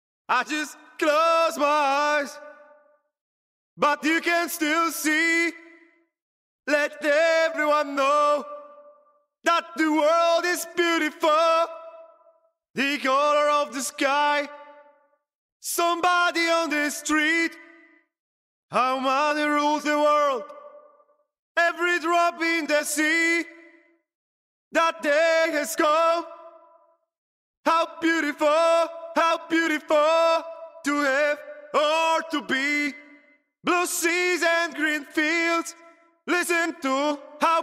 rock 160 Em, Acapellas

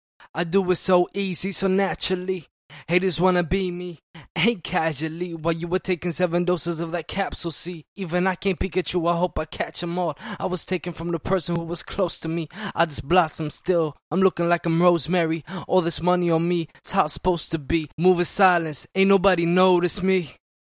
HH FM 153, apellas

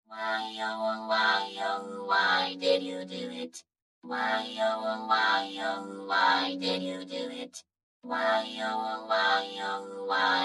electronic 100 A, Acapellas

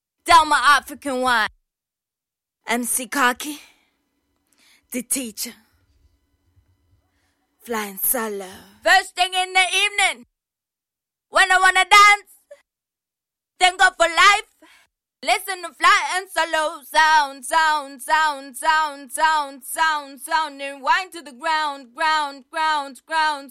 hip hop

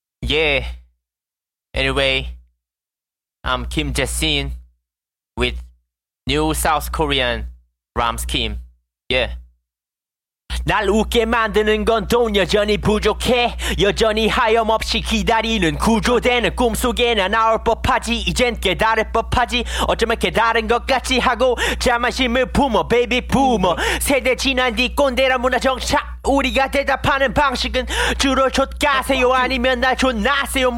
Acapellas boom rap 80 Dbm

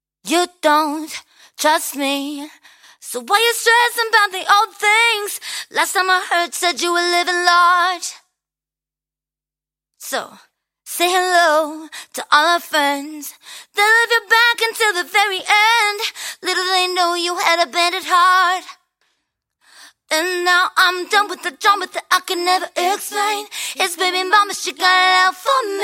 90BPM Key F

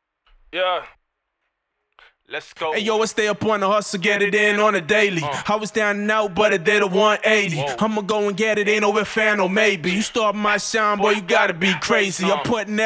HH 103 Am

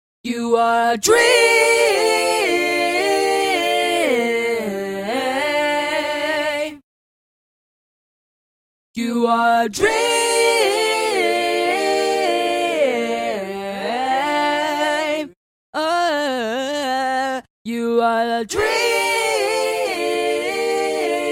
acoustic 110 Gm, Acapellas

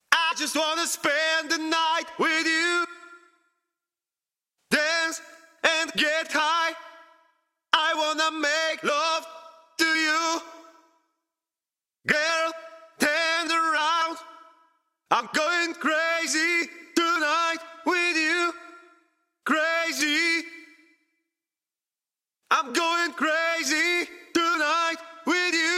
Acapellas deep house 130 E

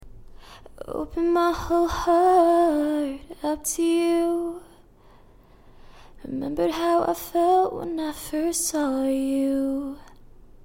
edm 123 D sharp, Acapellas

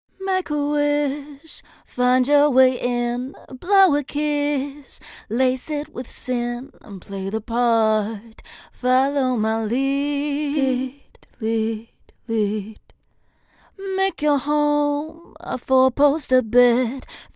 EDM pop dubstep 140 Gm

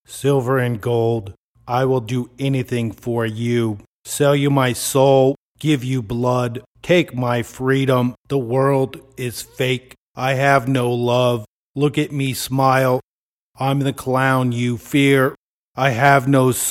weird, 120, Cm, Acapellas; Grand Sale

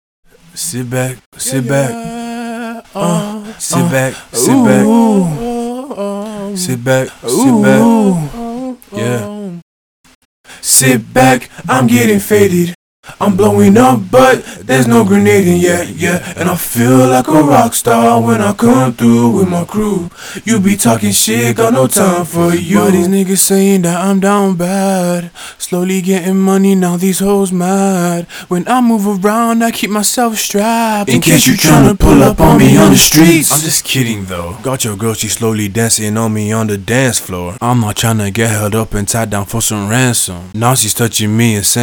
HH rap sing 80 Cm

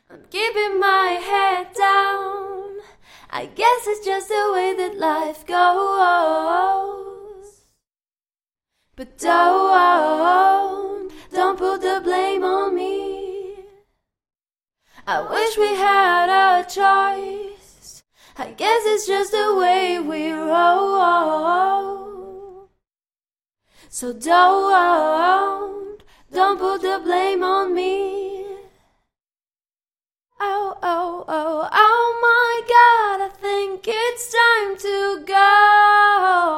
pop female EbM, Acapella; BPM / 73; Gender / Female; Style / Singing; Key / Em

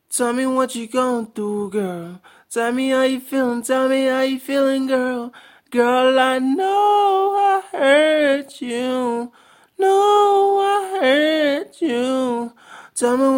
chill out, 90, Cm, acapella; I Hurt You

